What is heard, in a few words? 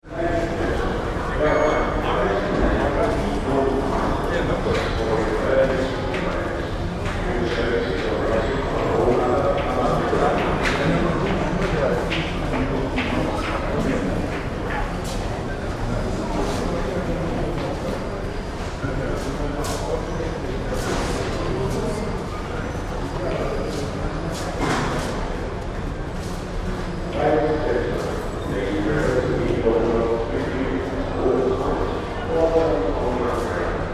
Train Subway Underground Station Ticket Hall; Background tannoy announcements; footsteps